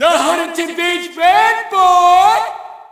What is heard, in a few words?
Shout, audience; UFC Tapout 2